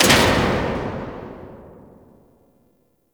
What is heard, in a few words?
Flood Light Switch On; Ambience; Gameplay; Warframe; Nineteen Ninety Nine